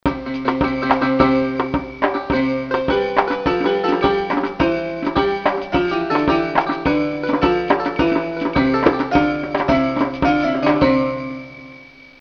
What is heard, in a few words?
Anthem